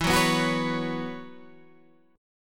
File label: B Suspended 2nd Suspended 4th, E, chord